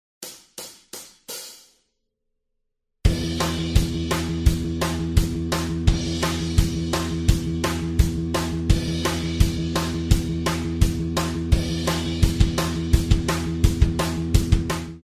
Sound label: Riff Snippet